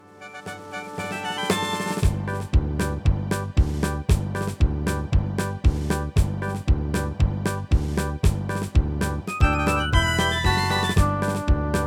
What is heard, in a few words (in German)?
Dinner-Marsch; songpakete demo